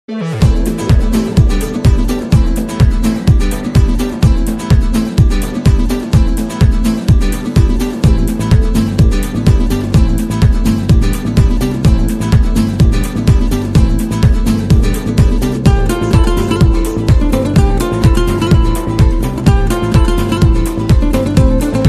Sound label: swiss guitar; ringtones